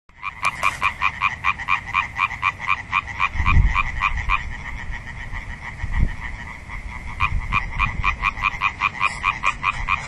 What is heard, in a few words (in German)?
Laubfrosch, Rufe